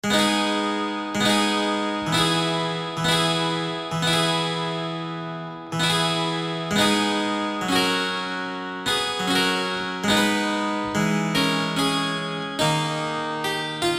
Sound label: English Harpsichord Nasalzug, Nasard; Fabordone del primer tono; Cabezon